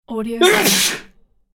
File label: the male sneeze